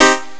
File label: piano acid; Amiga Soundtracker Sample Packs